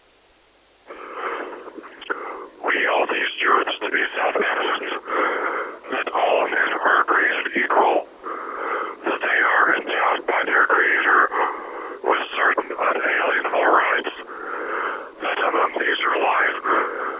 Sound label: whisper